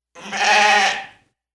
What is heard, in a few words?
Sheep